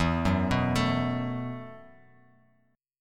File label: E Suspended 2nd Suspended 4th E, Bass Standard; arpeggiated; JGuitar